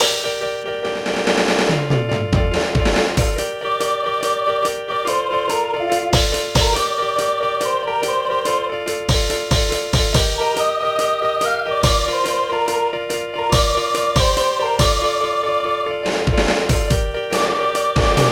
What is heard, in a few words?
band; BGM; infinitefusion